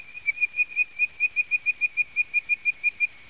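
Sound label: bird voice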